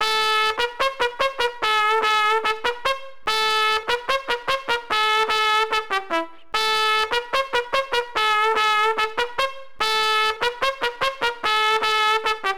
Trumpet riff; Sesame Street; Elmo's Musical Monsterpiece; NDS

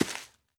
walk grass

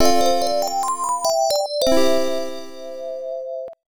Jingle (Inn); rpg tsukuru dante98 ii pc-98 1996

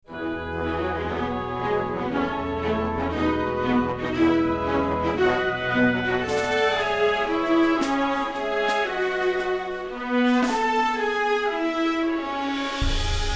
Happy Village; The Lord of the Rings Online; Turbine

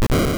Sludge Bomb; sfx; attack moves; pokemon; gen 2; gsc

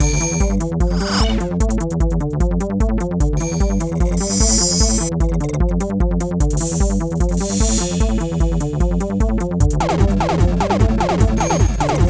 Electro

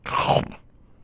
Attack Eat

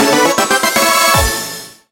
Win Battle Clear; Miitopia

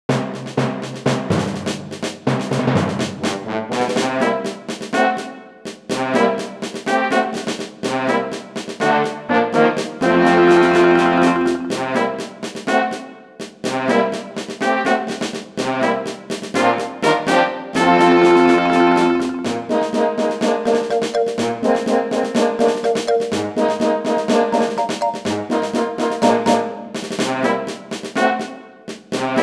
Victory March; Super Mario 3D Land

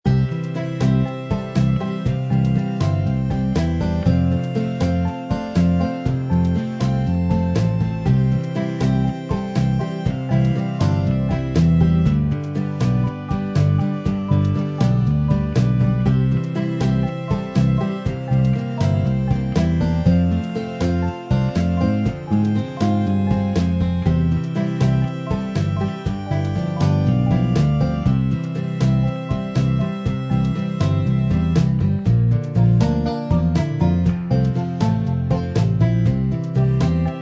Valley Music; Hay Day; android

